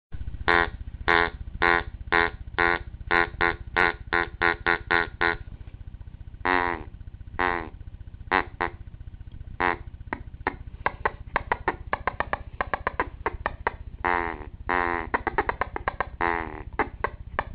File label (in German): Entenstimme